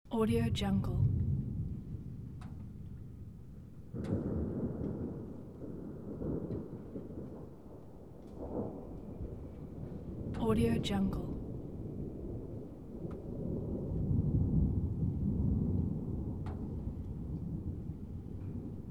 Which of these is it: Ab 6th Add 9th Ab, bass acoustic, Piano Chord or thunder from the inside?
thunder from the inside